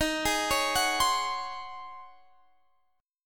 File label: C sharp Major 7th Suspended 2nd Suspended 4th, D sharp